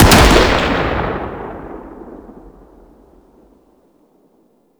Sniper Shot; Warframe; Tenno

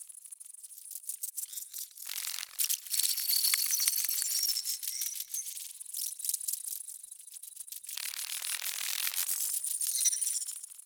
Landscape Insect Loop, Stereo; Positional Stereo Loops

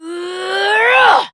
Abil Exert Adult, en; Warframe; Duviri; Drifter; Melee

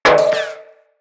Bullet Impact Debris Pipe; Weapons

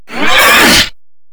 Grineer Hammer Boss Exertion, Vocals; Warframe

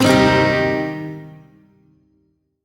Shawzin Blues Chord; Warframe; Duviri

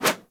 Throwing Dagger Idle Throw Whoosh; Warframe; Weapons

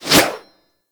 Axe Small Swing; Warframe; Weapons; Swings

